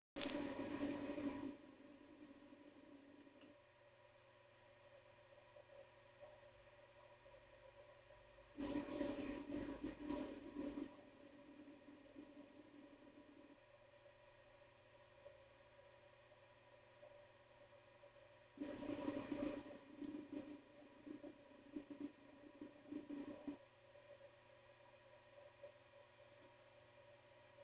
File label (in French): Bruit Seagate